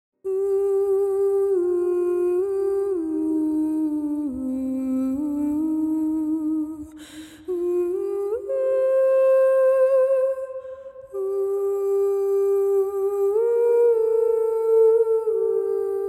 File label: Lumerian Chant